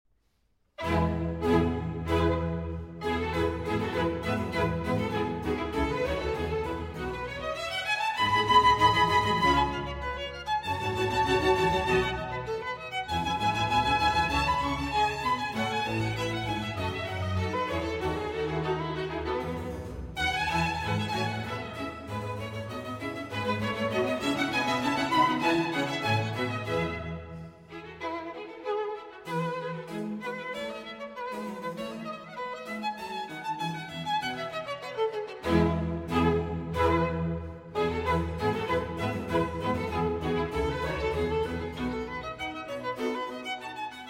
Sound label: Violin Concerto; Violin Concerto No. 2 in E Major, BWV 1042; J.S. Bach